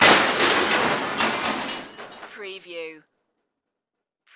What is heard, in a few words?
Crash Metal Glass